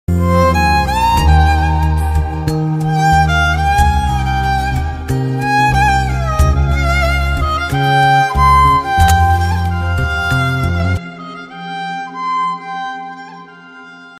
music background instrumental ringtone

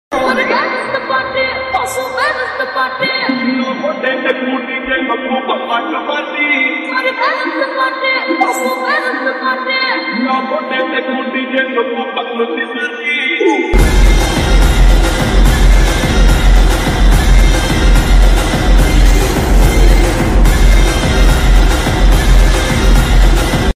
ringtone bgm music; waltair veerayya

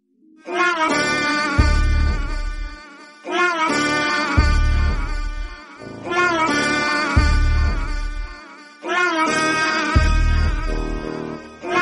villain scary bgm ringtone; dora; the evil within

